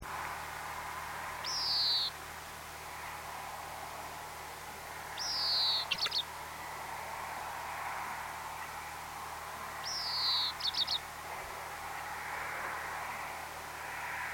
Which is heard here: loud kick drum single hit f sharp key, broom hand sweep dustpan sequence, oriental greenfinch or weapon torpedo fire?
oriental greenfinch